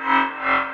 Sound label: Warning Beep; Barotrauma; Barotraumatic; Penance